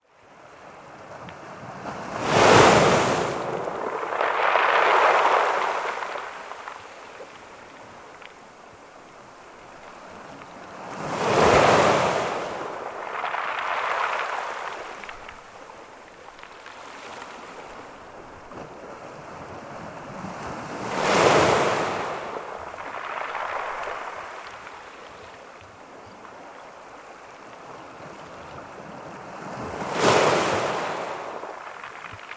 Vagues Ouessant

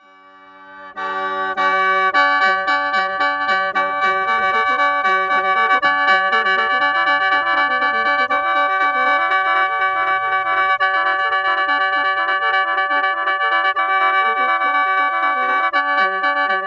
Khen orgue à bouche laos

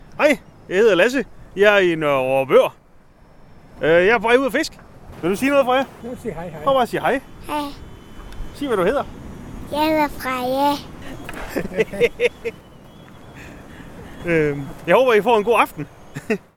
Pecheurs Vorupors

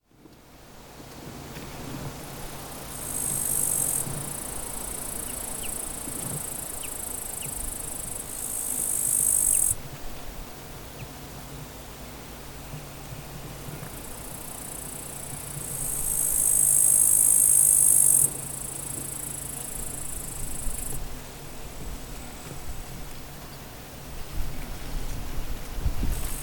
Sons d'un automne Estonien

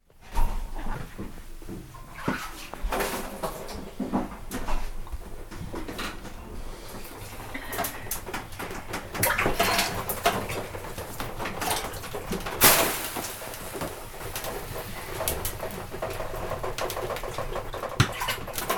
Finlande sauna les vapeurs

